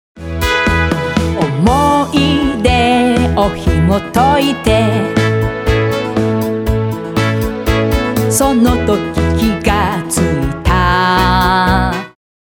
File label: refrain short